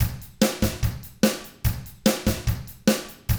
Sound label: rock drums